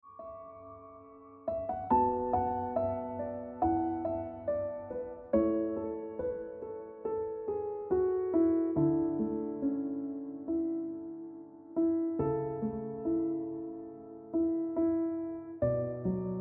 Ringtone

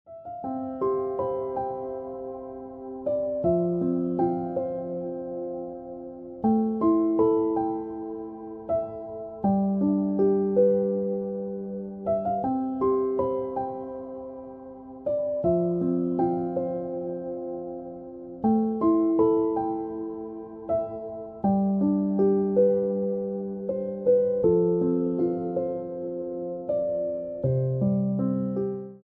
Piano Music

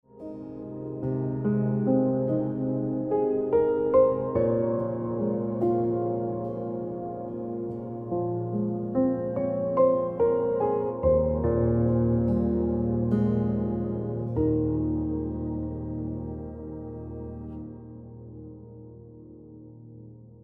Piano Music

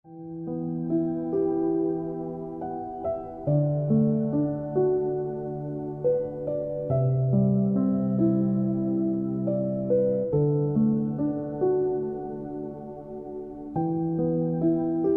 Piano Music

